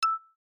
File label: Alert Beep; Motorola QRTY